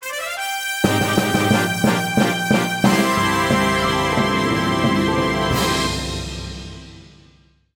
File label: Fanfale; Shinya Ichizoku The Battle for Hokkaido's Delicious Foods; Me Putit Fanfale 02 Gold